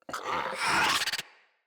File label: Infested Charger Run Breath, Enemies